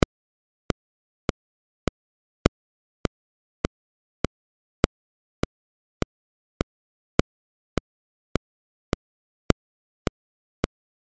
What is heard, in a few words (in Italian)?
click che accelera da 100 a 140